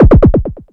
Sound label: machine gun; Zero-G Ambient 1